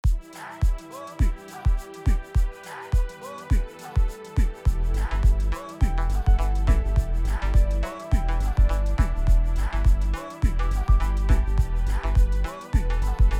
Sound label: Amapiano Beat 104bpm